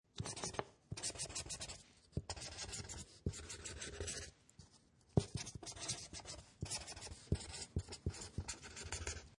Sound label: Writing With A Hard Marker, writing on paper with a hard marker pen; Writing Sound Effects; Writing (Sound Effects:Human)